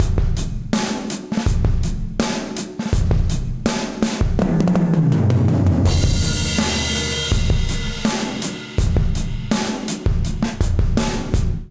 Low And Loud Fill Low Loud Squashed 82bpm, Session Drums; Session Drums Club; Ableton; Factory Packs